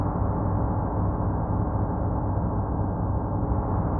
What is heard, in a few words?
sparse soundscape samples; ReLooped